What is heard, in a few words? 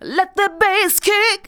vocals sung; Techno Trance Essentials